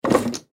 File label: Foosball Table; Foosball Table Players Double Turn; Games; Sports